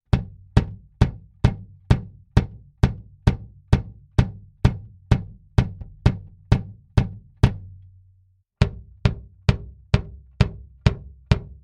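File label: bass drum Mic Test RE27ND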